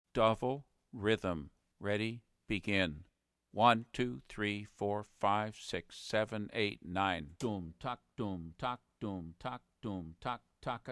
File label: Karsilama davul